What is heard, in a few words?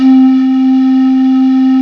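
PAN FLUTE C4; AKAI S-Series CD-ROM Sound Library VOL-2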